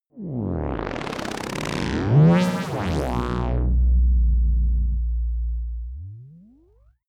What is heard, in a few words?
sci-fi electronics atmospheric disturbance